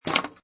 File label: Pinball Sound Ball Rattling Objects; Video Games; Lynne Music